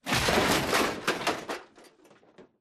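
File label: Stalker Nuke Destruct Explosion Metal Debris; Titanfall 2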